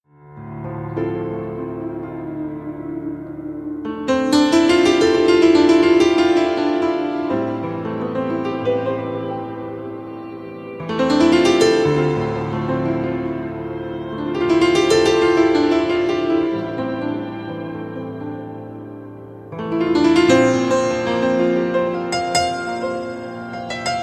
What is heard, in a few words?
Blue Piano